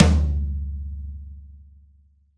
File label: Rock Tom, Roland BK-5